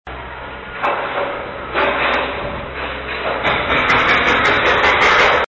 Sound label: shovel